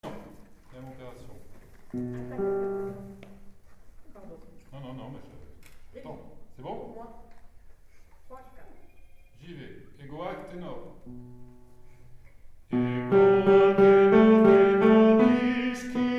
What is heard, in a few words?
Tenors